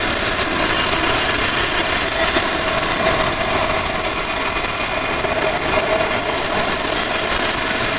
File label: Drone Ambient; Drums & Drones